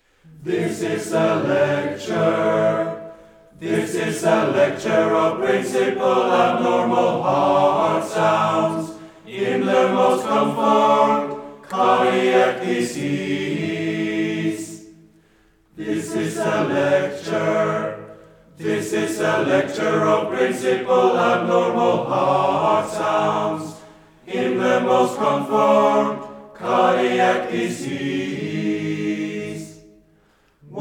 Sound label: heartsounds